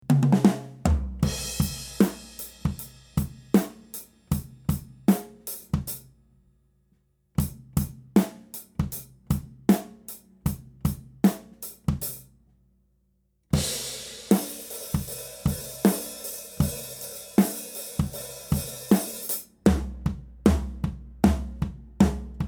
Section C OH and Side

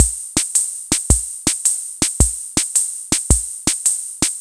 Shuffle B

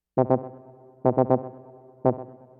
Novation MiniNova Embellishment

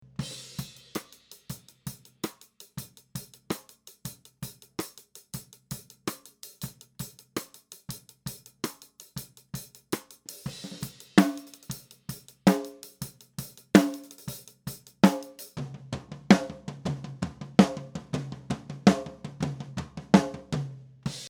Section A Snare

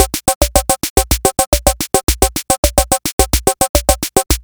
SAMBA

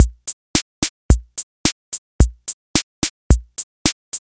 Rock B

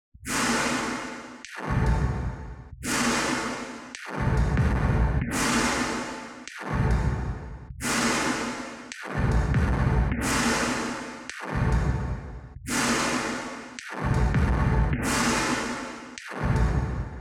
drums verb only

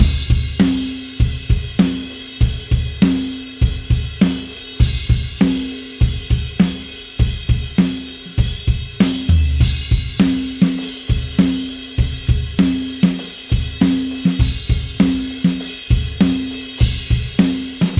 Full Kit; UAD Hemisphere and SD Mics Test

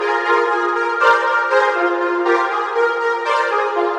Solina V Harmony

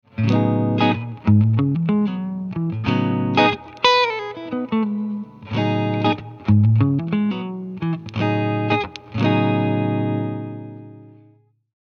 Clean

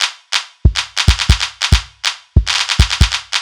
Clap Arrangement

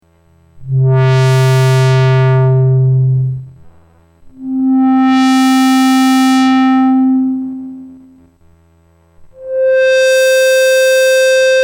sq fltr sweep

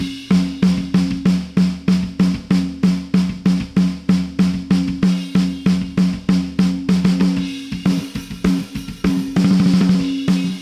Snare ONLY FULL EQ

